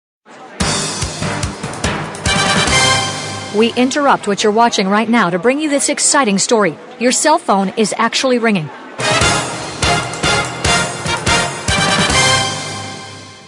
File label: breaking news, Comedy Ringtones